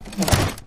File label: popup show; Racetrack Riches; megaboard